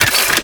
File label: Mech End; Warframe; Weapons; Archwing; Arch Anti Aircraft Gun; Kuva Grattler